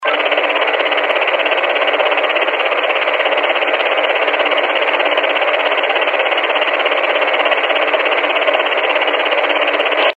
DMR FDMA Simplex; FDMA mode when used simplex (talk-around) mode

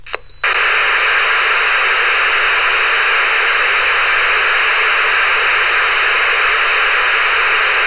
Marconi DM8000 DES; MASC Voice Scrambling

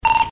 Moto Talk Permit; Motorola Trunking Talk Permit Tone; This is the sound a user's radio makes when the request to transmit on a trunk system has been granted by the system controller.

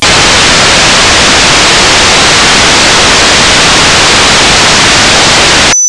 securenet desxl raw; Motorola SECURENET; analog encryption format; CVSD encoding

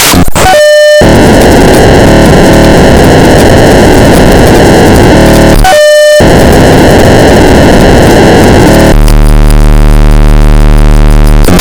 POCSAG 1200, POCSAG Paging; Post Office Code Standardization Advisory Group

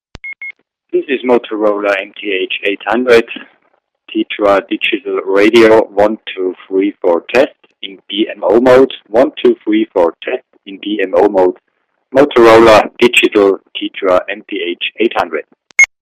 tetra audio; what it sounds like to the end-user (DMO mode - non-repeated)

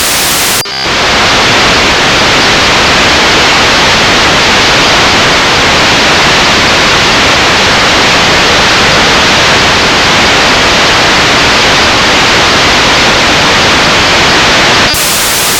AEGIS VGE; Aegis VGE Encryption; This is a proprietary 64-bit encryption used on older GE radios

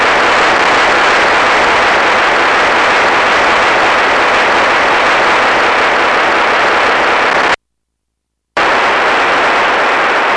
H DQPSK; P25 H-DQPSK; This is what the repeaters on a Phase-II (TDMA) trunk system transmit on the voice channels when a conversation is in progress.

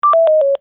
TRBO low battery

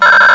GE STAR; This form of unit ID was General Electric's answer to MDC-1200

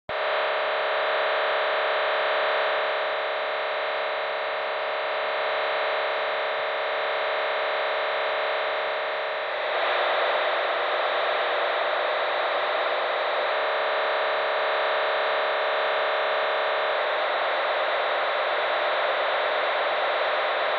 OpenSky SOI scanner; Harris OpenSky Scene of Incident Mode

